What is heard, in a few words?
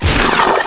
vamp; uhexen2